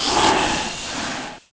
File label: moan; The Elder Scrolls III Morrowind GOTY; XBOX